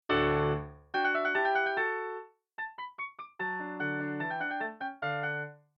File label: fortepiano